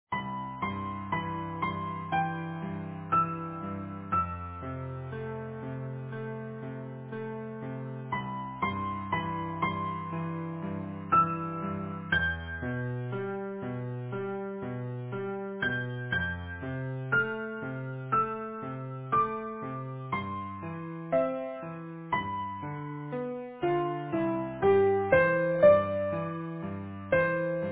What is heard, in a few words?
easy piano